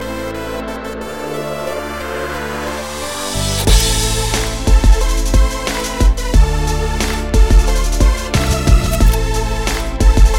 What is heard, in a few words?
Msg Beat